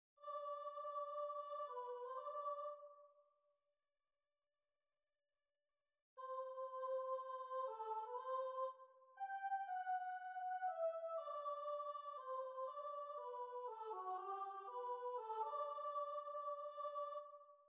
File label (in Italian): coro soprani; Idomeneo